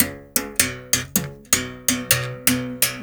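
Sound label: BS BERIM GRV, BERIMBAU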